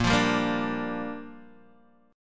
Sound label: A sharp 6th, Guitar Open D